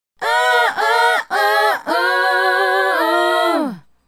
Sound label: Female Group, Hip-Hop and RnB Vocals